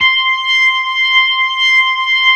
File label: C6, B3